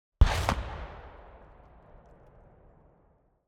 RPG - FIRING AND IMPACT - Through Car Roof Hit Metal; MKH8040; Pole Position; The Warfare 2 Library